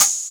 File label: hot open hat one shot g sharp key; open hat samples